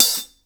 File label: Hi Hats; Instruments & Percussions; InVision Interactive; Lightware VOL-1